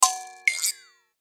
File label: Percussion Stabs, Hit, Stab, Sharp, Chord, High; Multimedia; Production Element, Imaging Element, Accent, Transition; Blastwave